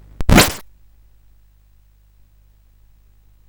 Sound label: sid CSG8580R5; California Games